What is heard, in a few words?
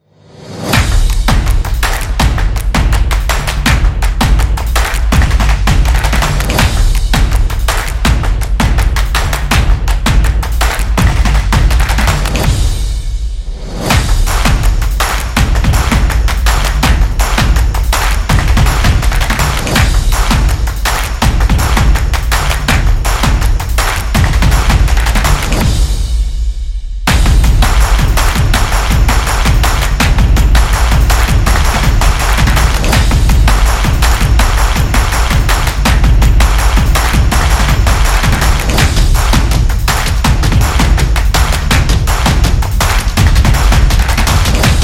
Percussion Beat